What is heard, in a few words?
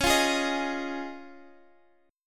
C sharp Major Add 9th, Guitar, Half Step Down; C#add9